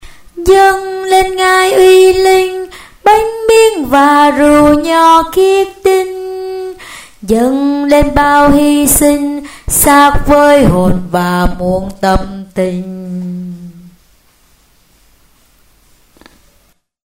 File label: tenor basso